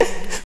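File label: hum hiccup; AMG - Now CD-ROM (Roland); Soun'Bwoy!; Ragga Samples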